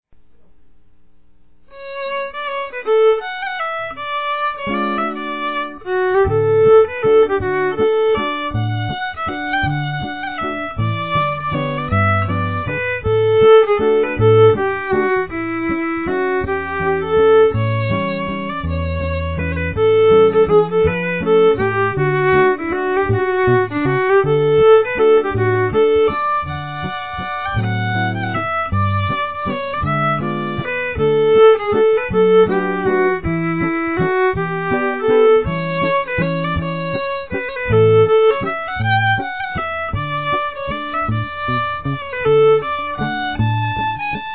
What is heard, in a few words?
Fiddler Waltz D; Valses